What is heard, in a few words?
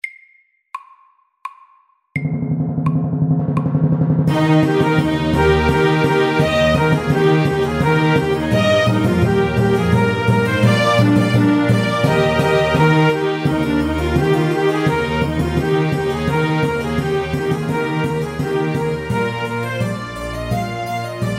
Air de trompette MIDDELS